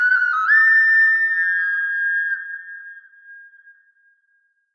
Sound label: Hunt Signal; Assassin's Creed III